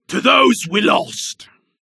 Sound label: Bar Cheering when drinking